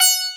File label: SITAR